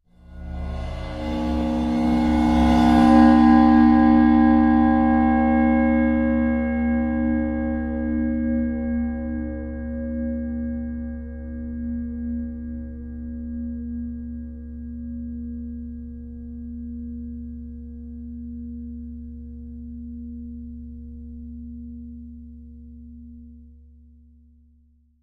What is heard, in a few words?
Ride Cymbal Bow, cymbal & gong; Old Ride Cymbol Bow CU 5